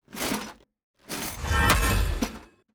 hammer of justice strike

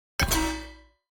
environment battle; Shelter

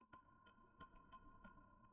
stir

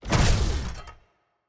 Orbital Spikes Hit; TANK; 3Souls

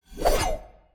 sword swing; Shelter

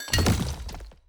successful coridor build

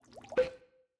ambience room alchemy lab potion ready

